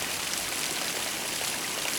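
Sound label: Ambient Hub Fountain; the princess and the frog; Mansion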